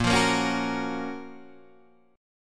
F sharp Major 7th

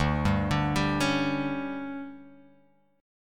D Major 7th Suspended 4th, chord; DM7sus4; arpeggiated; JGuitar

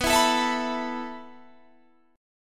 A Suspended 2nd B

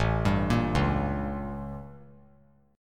A 7th Flat 9th; A7b9 arpeggiated; JGuitar

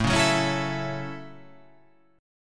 A 6th, guitar

